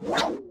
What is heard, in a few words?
spring; Nick Summer Sports Stars